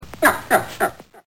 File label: nyuk